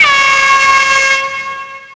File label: Air Horn R; Grand Theft Auto Vice City; XBOX